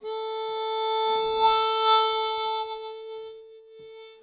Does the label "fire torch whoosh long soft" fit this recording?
No